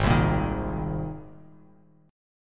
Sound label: G sharp Major Add 9th G sharp, Bass Standard; G#add9 strummed; JGuitar